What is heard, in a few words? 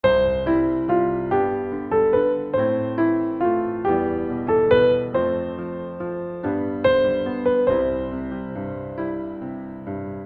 Piano Sopran